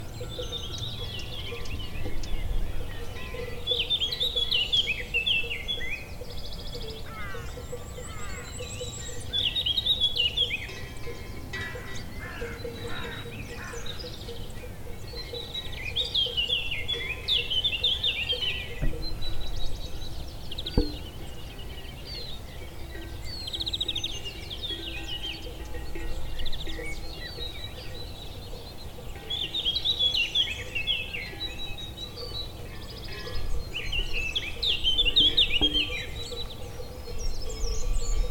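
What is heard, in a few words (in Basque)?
txinbo kaxkabeltza, Curruca capirotada, Sylvia atricapilla, Canto; Observatorio de aves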